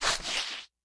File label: Out Animations Repair Small